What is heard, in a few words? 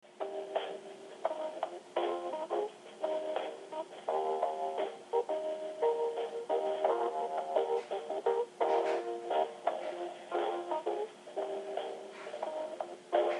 Hold Music; Frontier Communications